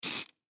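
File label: hair spray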